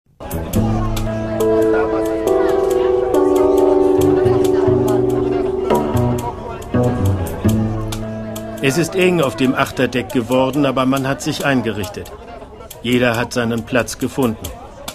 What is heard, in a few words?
taxi water real